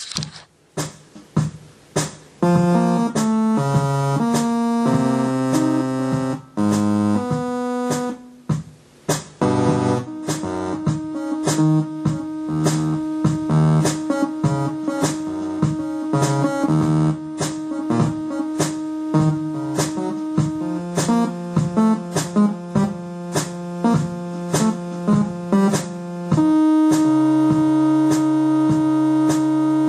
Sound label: Saw Lead